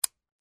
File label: settings click; WiiUPluginLoader